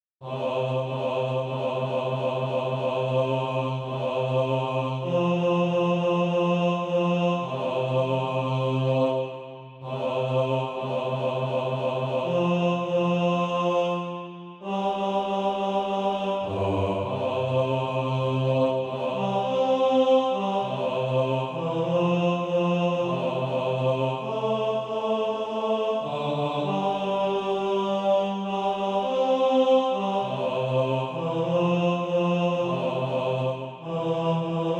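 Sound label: bass, Practice Music; A Glorious Church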